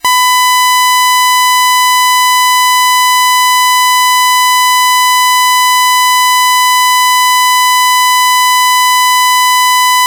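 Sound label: 1kHz sawtooth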